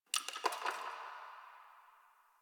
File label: Ice Crack One Off